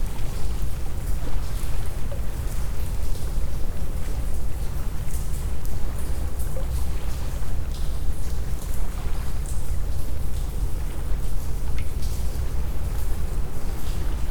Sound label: ocean cave, ambiance